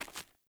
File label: Walk Stone, Footsteps; Player; Mother